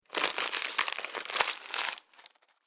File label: Crunching Plastic Wrapper Noise; Crinkling Sound Effects; Foley